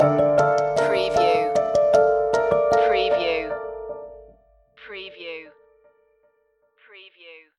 sinking stinger, A dark and ominous track using orchestral elements; orchestral soundtrack; mystery